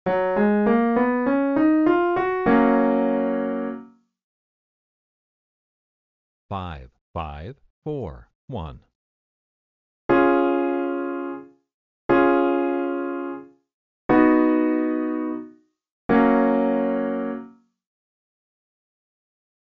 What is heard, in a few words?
I, IV, V, progressions; easy